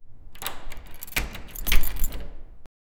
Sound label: key unlocked door; doors